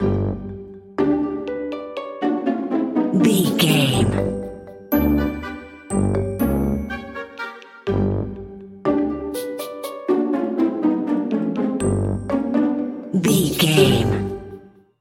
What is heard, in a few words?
sneaky lurking